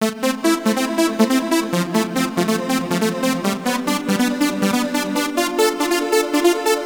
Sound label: melo, party